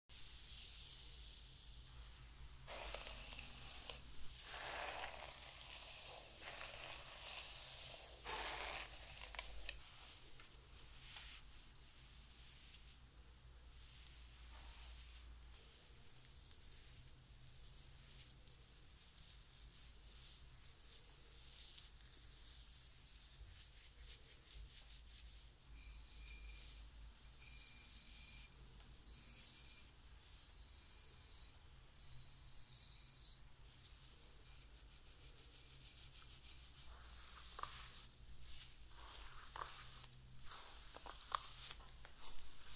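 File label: head massage, asmr; ماساژ سر اسمر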